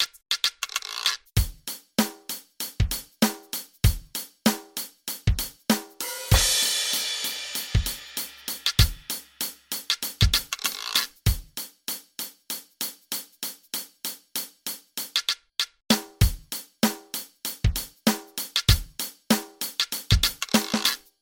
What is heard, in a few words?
Electronic drum